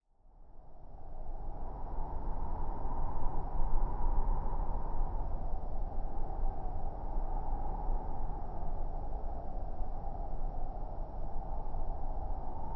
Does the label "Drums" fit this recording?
No